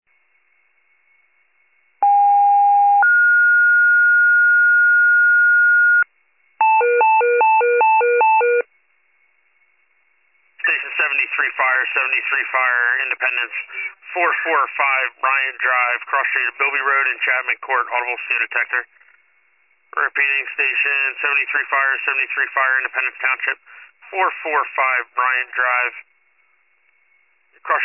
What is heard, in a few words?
fire, pager alert